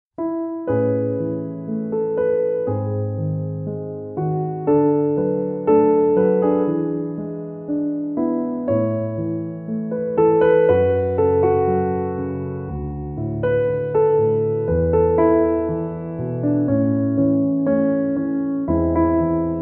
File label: Piano Instrumental